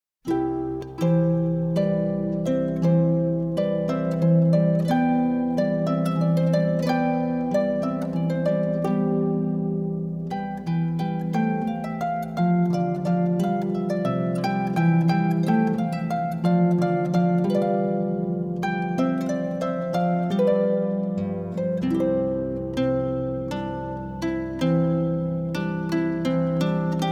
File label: Hornpipe From Water Music, harp; wedding